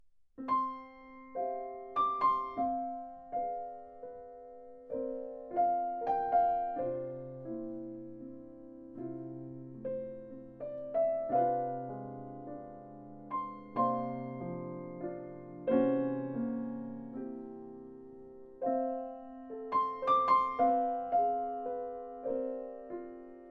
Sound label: melody at night